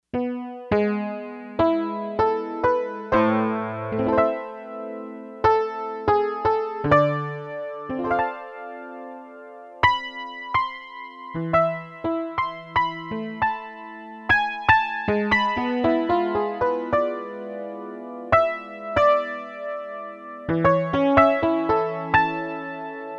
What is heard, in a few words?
Spread Piano, Yamaha TX802; Performances; Factory Patches